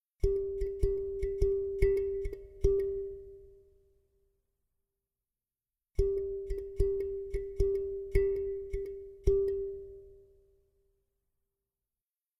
Rhythmic motifs on single pitch, change in tempo, timbre, dynamics